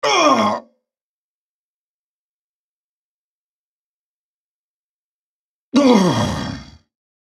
dying

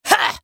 effort; Nidalee; Character Voices - English

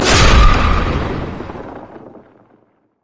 jail wall; LoL; thresh

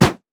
jack in the box basic atta; jester

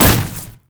rumble carpet bomb

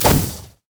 bandage toss; LoL; badrobotamumu

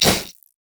voidling effort; LoL; alzahar

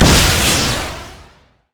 rocket grab; LoL; blitzcrank skin07

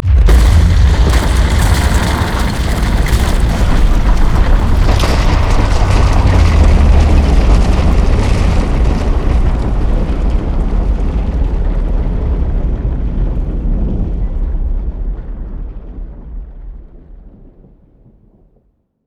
hero tower break; LoL; emitters map12

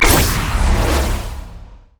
shock blast wall; LoL; jayce

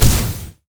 sonic wave; LoL; leesin; blindmonk

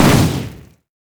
missile barrage normal; LoL; corki

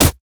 blue minion melee basic attack; LoL

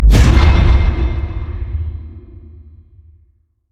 summoner fortify; LoL

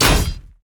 basicattack; LoL; Nocturne

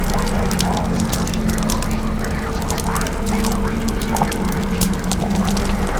green fire

